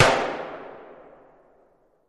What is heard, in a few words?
Gun AR-15 Single Shot Fire